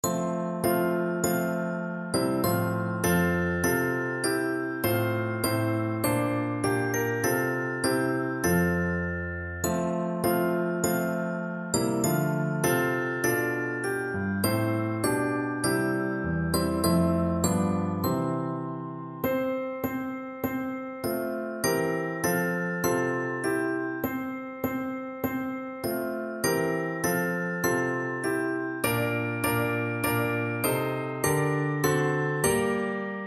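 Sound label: glockenspiel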